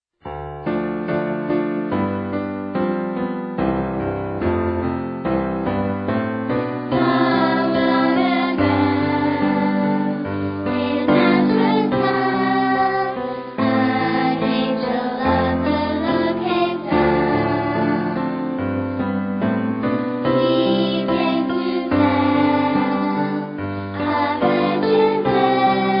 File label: music; advent